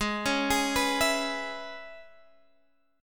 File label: E 6th, G sharp